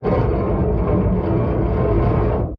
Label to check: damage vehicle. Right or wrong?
Right